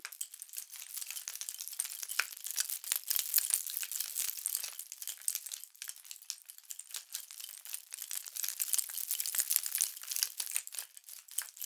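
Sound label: rattlebone, percussion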